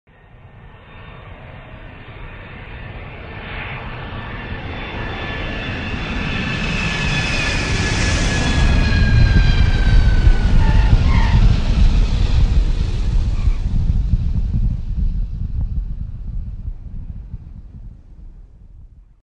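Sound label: airplane approach and landing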